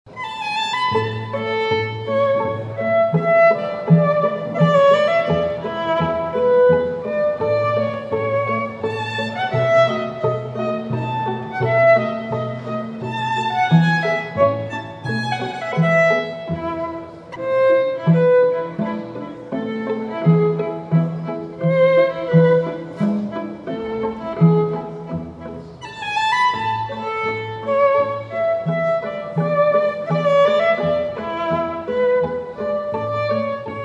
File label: menuet, string quartet